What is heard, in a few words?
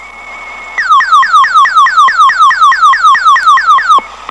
Plectron alert